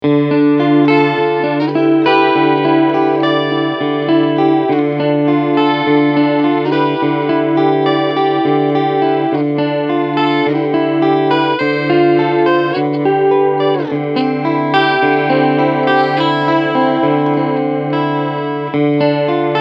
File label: Chorus Setting